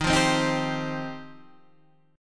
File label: G 7th Suspended 4th Sharp 5th